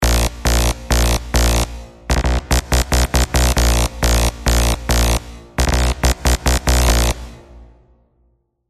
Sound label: KNARZ BASS